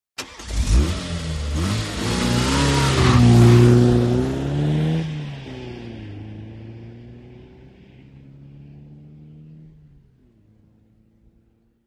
VEHICLES ASTON MONTEGO: EXT: Start, depart, fast, small peel out.